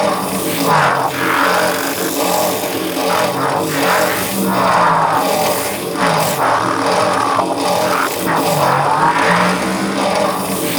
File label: Launch Pad Small Generator Machine Loop; Ambience; Props; Warframe; Corpus Railjack